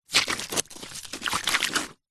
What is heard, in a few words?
wood stick movement sludge wet mud squelch; foley